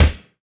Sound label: P-51D Cockpit Browning IN End; DCS